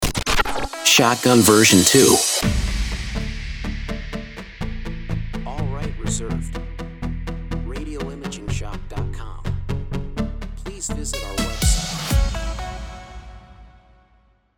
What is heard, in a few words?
Shotgun